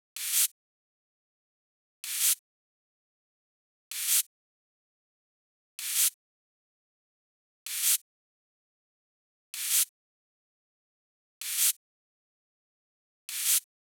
reverse, Key E, Bpm 128, Big Room House; Loop Nerds; Big Room House Allstarz

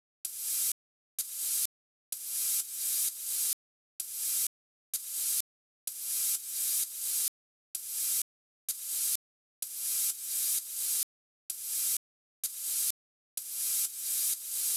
sweep, Key F# Bpm 128; Big Room House; Loop Nerds; Big Room House Allstarz

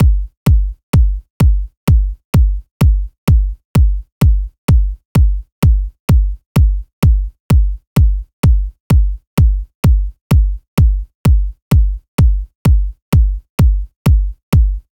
bass drum, Key C#, Bpm 128, Big Room House; Loop Nerds; Big Room House Allstarz

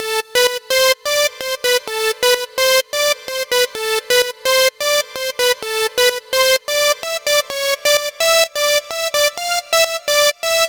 synth melody 2, Key D#, Bpm 128, Big Room House; Loop Nerds; Big Room House Allstarz

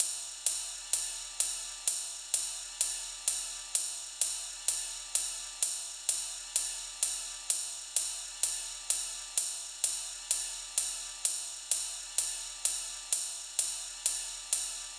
ride, Key D#, Bpm 128; Big Room House; Loop Nerds; Big Room House Allstarz

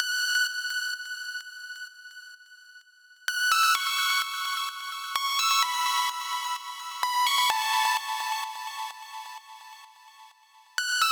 high melody synth, Key F# Bpm 128; Big Room House; Loop Nerds; Big Room House Allstarz

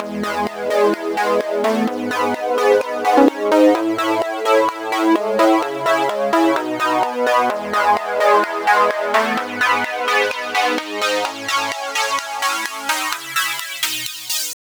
sequencer intro, Key E, Bpm 128, Big Room House; Loop Nerds; Big Room House Allstarz

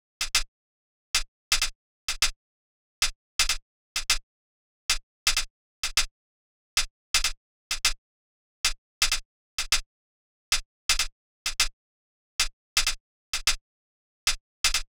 high hat, Key C# Bpm 128; Big Room House; Loop Nerds; Big Room House Allstarz

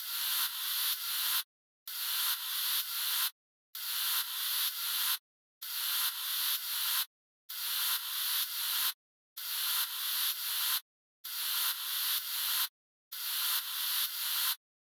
fx sweep, Key F#, Bpm 128; Big Room House; Loop Nerds; Big Room House Allstarz

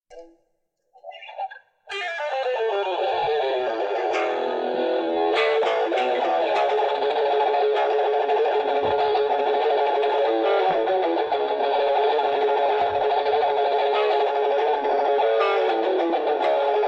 riff tapes

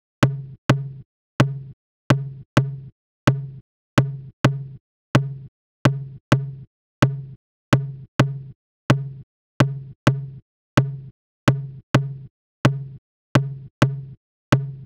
tom high, Big Room House, Key C#, Bpm 128; Loop Nerds; Big Room House Allstarz

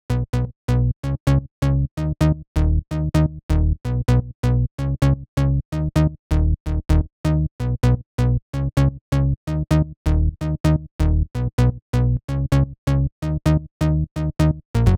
bass, Key F#, Bpm 128, Big Room House; Loop Nerds; Big Room House Allstarz

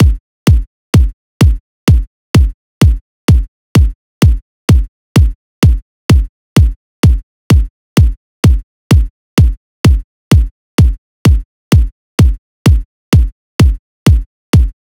bass drum, Key C#, Bpm 128, Big Room House; Loop Nerds; Big Room House Allstarz

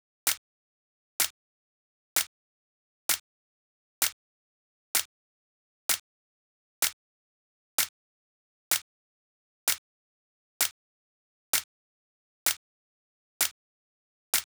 snare, Key E, Bpm 128, Big Room House; Loop Nerds; Big Room House Allstarz

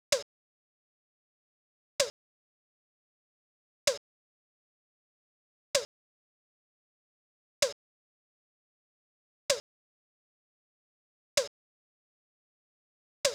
perc, Key E Bpm 128; Big Room House; Loop Nerds; Big Room House Allstarz

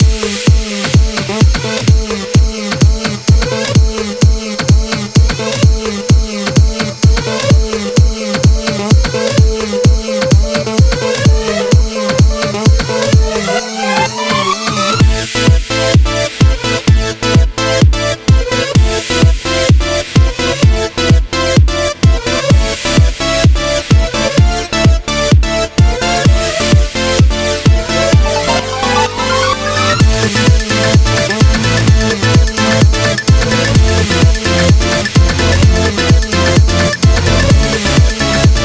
FULL MIX, Big Room House, Key A, Bpm 128; Loop Nerds; Big Room House Allstarz

